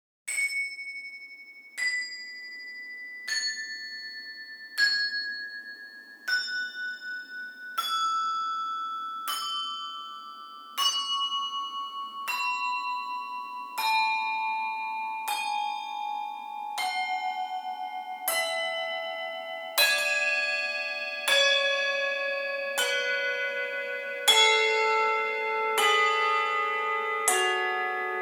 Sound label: Handbells Rounds; Marston